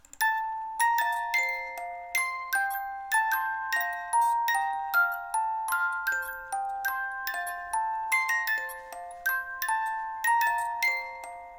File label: mozart piano sonata